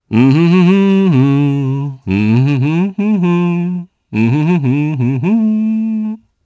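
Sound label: Vox Hum Japanese; Ezekiel; kingsraid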